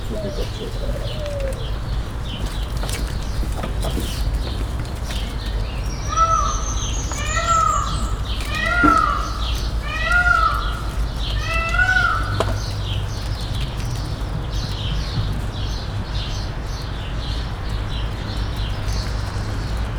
ötször, éppen az ormányos medvéknél voltunk, páva; kecskemeti zoo 2016

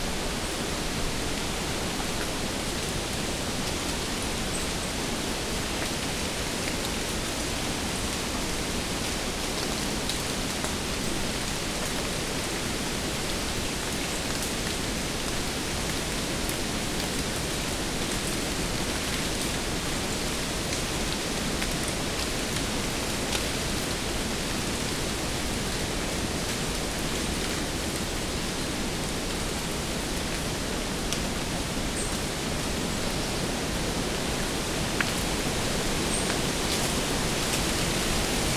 nincs bőgés, lombkorona magasságában fújó szél, zörgő levelek; gímszarvas; Szeldcepuszta

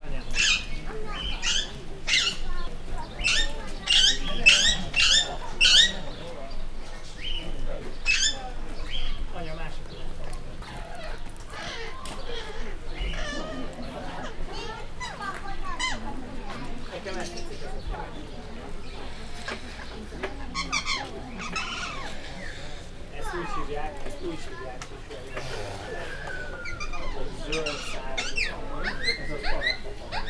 valamilyen ara, papagájok; Győri Zoo 2014

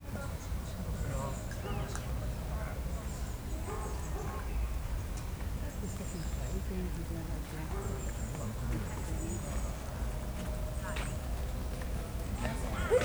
uhu; szegedi zoo 2011; gyermeknap gyermekszaj

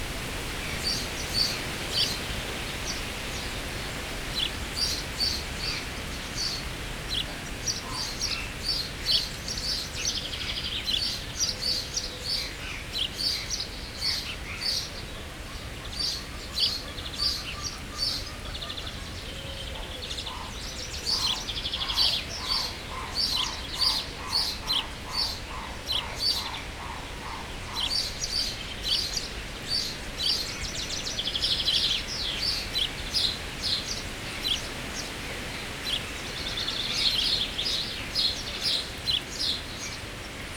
szél; jaszbereny

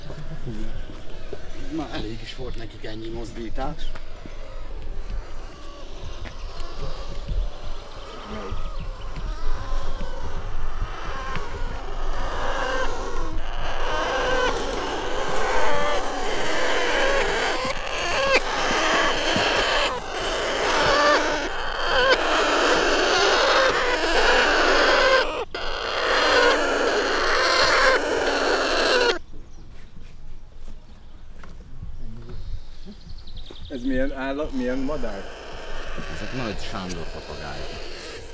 egy hónapos fiókák, védekező hang, nagy sándorpapagáj; jaszberenyi zoo 2016

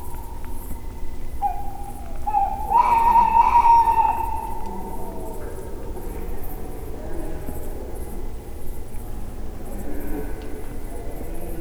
macskabagoly; termeszetben

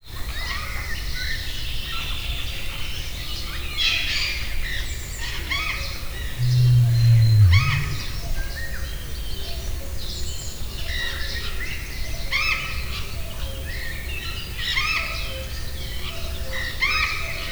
erdei hangulat, nandu; miskolci zoo 2009

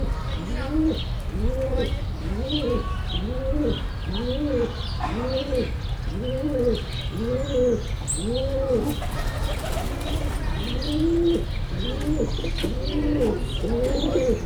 bugas es repkedes, debreceni pergo galamb; debreceni zoo 2019

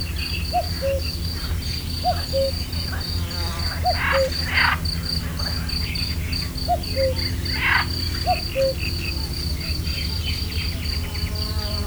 vartyog kakukk, kecskebéka; termeszetben

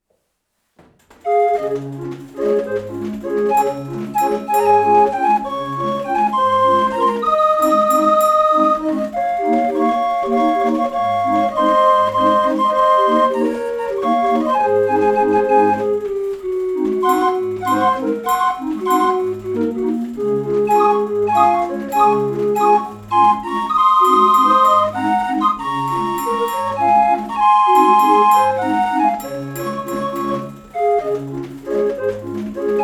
kintorna hengeres 26 hang 20 dallamu osztrak; 1876; sebastian konrad; verklihangmuzeum

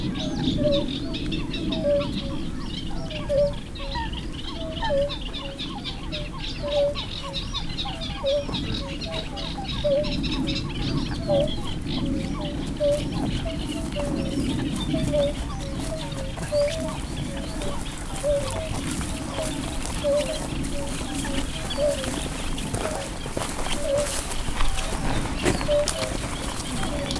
mikrofon mögött elhaladó csapat, turisták; hortobagyi halastavak

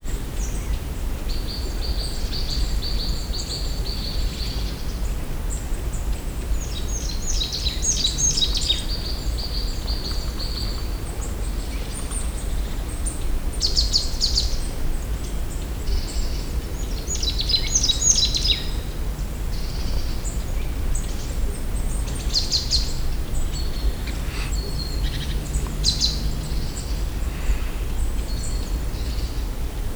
egyik hangja visegrádi hegység, csuszka; termeszetben

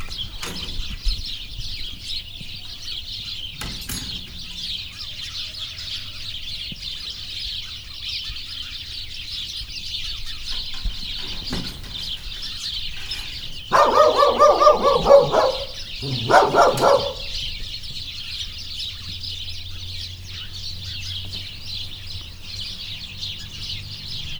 verébcsapat kutya, házi veréb; somogyszentpal; parasztudvar

